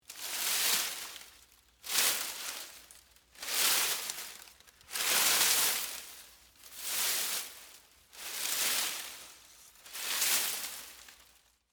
Foliage Walk, Footsteps, Foliage, Walk, Through, Brush; Footstep Sound Effects; Human; Blast Wave